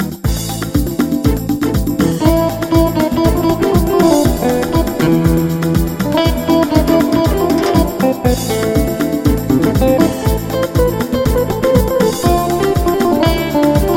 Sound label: Gypsy